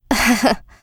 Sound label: laugh; Eternal Return; Katja; ENG